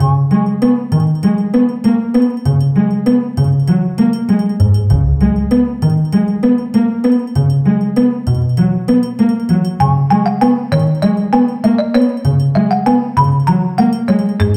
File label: Cave; Machine Knight; 3DS